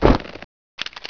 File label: melee